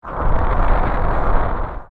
Volvagia Emerge Partial; OOT

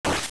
Sword Hit, Sword hitting an enemy; AOL

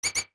Rat; Rat squeaking; Zelda

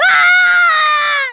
KO; Young Link knocked off the stage (regular and far)

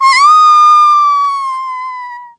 Smashed Distant; Zelda smashed into the distance

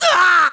Hurt Bad; Link getting hurt badly; Skyward Sword

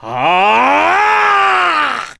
Charge Attack; Charge-up magic attack (voice); Ganondorf

